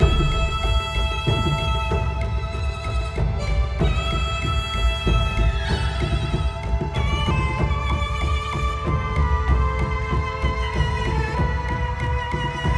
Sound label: Music Tutorial; Sifu